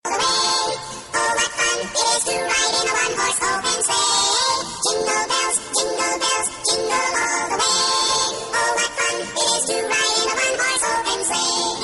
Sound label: Crazy Jingle